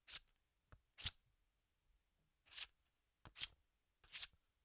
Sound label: Knife Slice